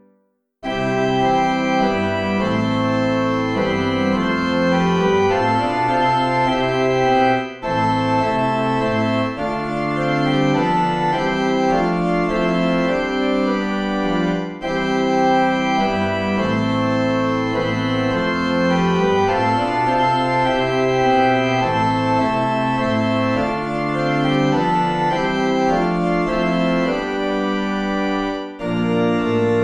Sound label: Organ